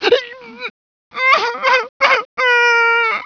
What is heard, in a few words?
scream